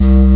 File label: low bottle; sonix; Rise Of The Dragon; chipmusic